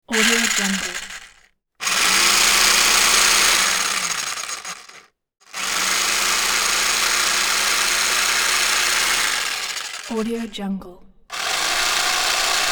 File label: hedge trimmer servo